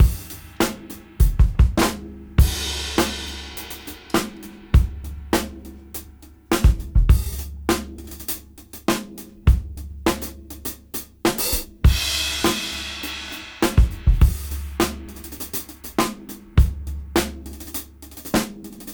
Old School 101 BPM; AMG - Keith LeBlanc Phat Butt Jams

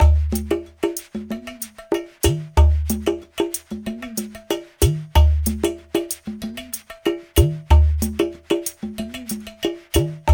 Moose Groove, African Contemporary; Spectrasonics; Supreme Beats

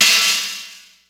Open Hat, Ensoniq ASR-X Pro

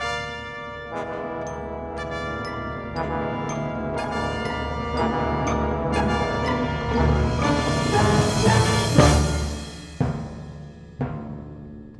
Orchestra, Rock Pop; Sonic Foundry (Sony Creative Software); Orchestral Series VOL-4 - Rock and Pop

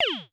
FREQ HIT, Dual Percussion Synthesiser; Klone

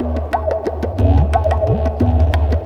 Ambi Groove

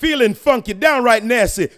VOX SUNG; AMG Megabass REMIX! VOL-2

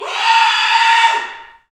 Bullhorn, Afro Horns, Africa

